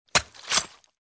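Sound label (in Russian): удар и поворот в ране; Звук мечей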